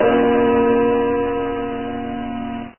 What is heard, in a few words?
tower clock; deliantra